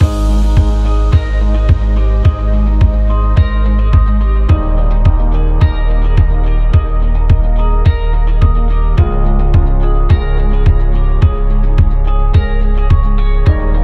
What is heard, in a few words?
Corporate Soft Loop